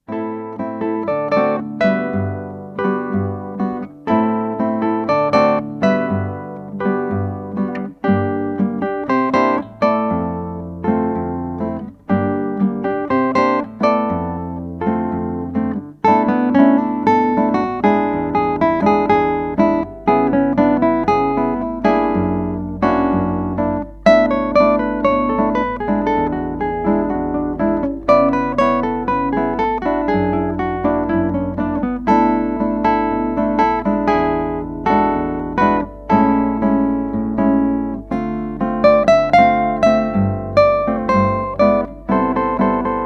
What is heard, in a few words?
cha cha